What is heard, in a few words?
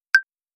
click